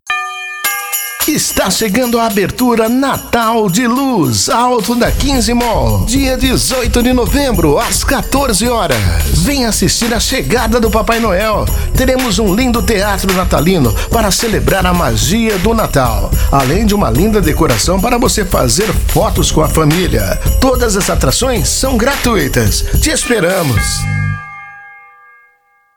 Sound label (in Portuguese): Abertura; Natal de Luz Alto da XV Mall